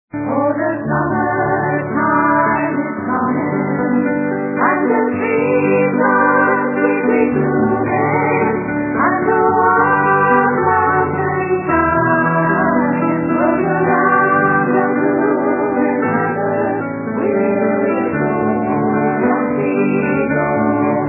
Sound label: lofi; Will Ye Go, Lassie, Go (Song)